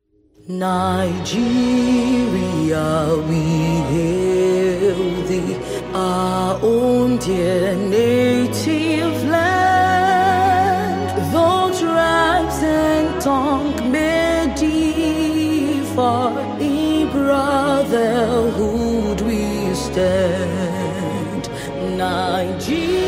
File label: Nigeria We Hail Thee Anthem